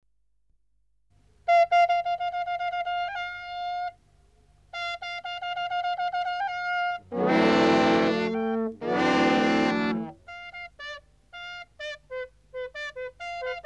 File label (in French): trompe